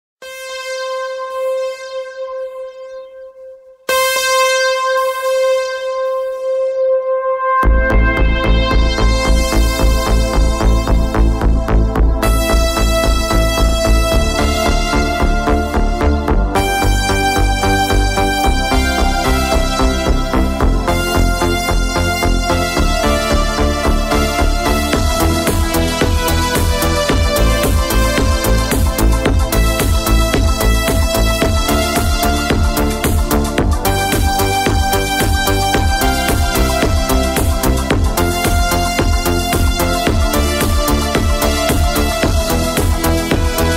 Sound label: korg modern beat korg pa900; Sergey K